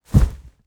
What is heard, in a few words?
Horror Body Drop On Hay